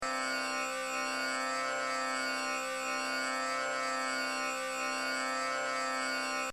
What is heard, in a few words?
C Tampura